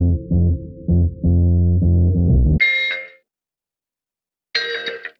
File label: keys, Phonky loops